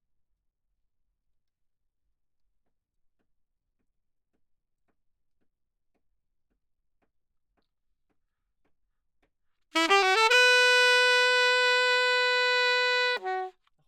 Sax; Gefell

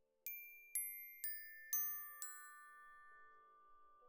Glock Close

